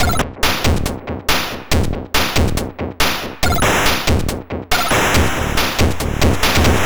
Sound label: Drums, 140 BPM; CHIPSHOP DELUXE; CHIPSHOP LOOPS